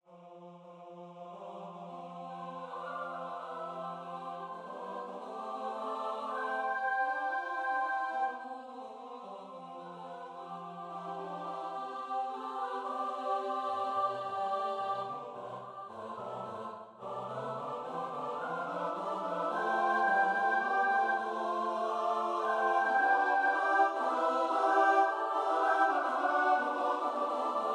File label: Chamber Music